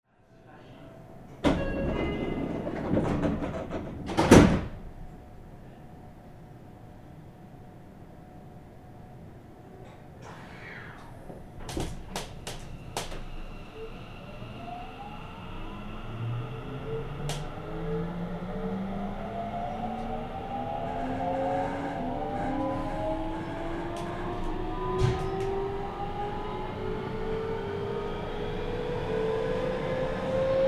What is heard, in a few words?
Seibu 30000